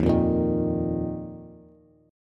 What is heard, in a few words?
Eb Suspended 2nd, Bass; Ebsus2 strummed; JGuitar